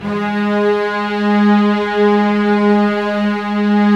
STR SEC, STRINGS; Roland - LA Composer vol. 1

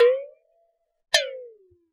WATERBEL, WATERBELL; Univers Sons - Raricussions

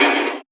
Snare, Kit; Sonic Foundry (Sony Creative Software); Esoterik Beatz; Agrymet

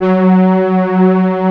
MATRIX 12, PADS; Masterbits; Vintage Voltage

